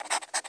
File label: rename; allods2d